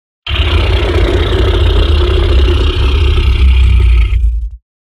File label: Alligator tiger